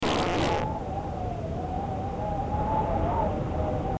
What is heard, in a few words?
chute fart